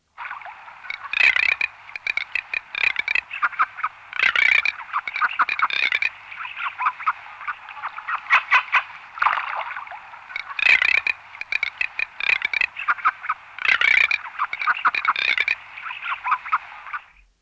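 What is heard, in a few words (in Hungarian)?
Böjti réce; Madarak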